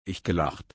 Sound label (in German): lachen